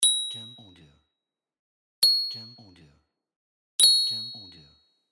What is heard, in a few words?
Jingle Ringing